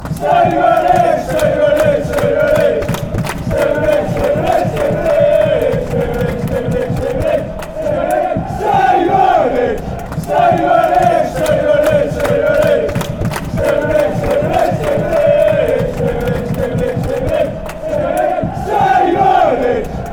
stevenage stevenage stevenage

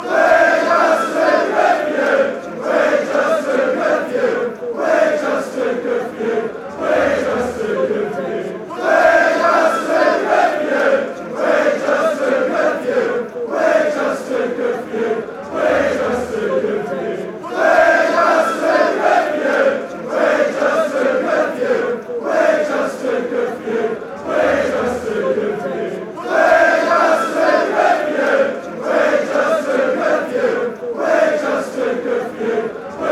chants

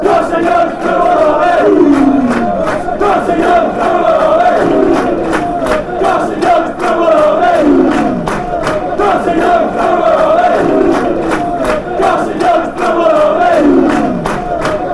blue white army, fanchants; carson yeung

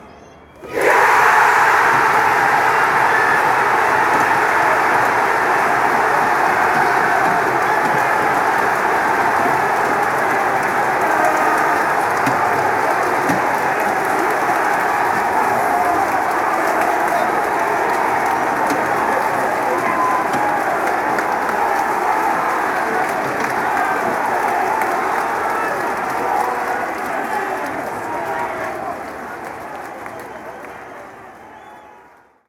chants

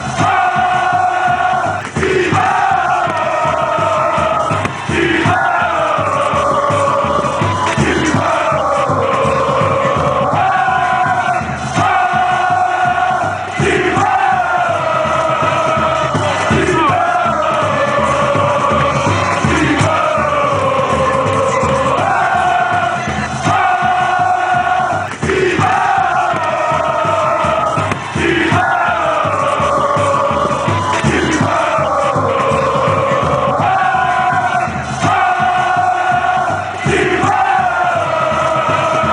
oooo oooo timaoooooo, chants

